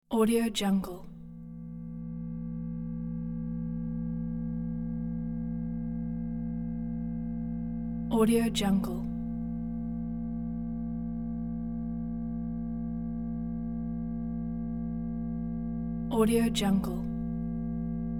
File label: space shuttle interior atmosphere; cinematic-designs